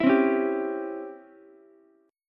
Db Minor Major 7th Flat 5th Db down Ukulele; DbmM7b5 strummed; JGuitar

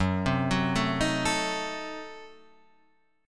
Gb Diminished 7th Guitar Standard; arpeggiated; JGuitar

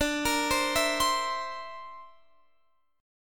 D 7th Suspended 2nd Sharp 5th D, Guitar Standard; D7sus2#5 arpeggiated

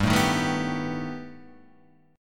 B Augmented 7th G

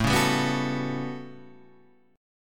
A 13th, Guitar Standard, chord; strummed

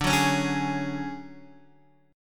D Major Sharp 11th D Guitar DADGAD, chord

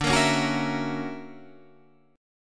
D Augmented Major 9th down Guitar Half Step Down

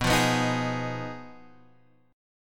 B 6th Flat 5th B down, chord

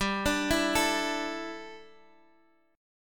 A 7th Suspended 4th G

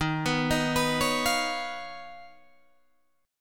D sharp 7th Suspended 2nd Sharp 5th Guitar Drop D

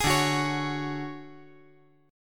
D Major Sharp 11th D, chord

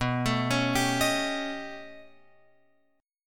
B Augmented 9th B down Guitar Open F; arpeggiated; JGuitar

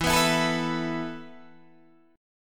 E Suspended 4th, Guitar, Standard; strummed; 12,14,14,14,12,12; JGuitar

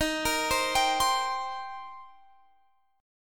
D sharp 6th, Guitar, Standard; arpeggiated; x,18,20,17,20,20; JGuitar

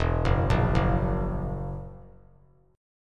E Suspended 2nd Flat 5th E down; arpeggiated; JGuitar

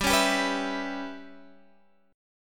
G 6th Flat 5th, Guitar, Half Step Down; G6b5

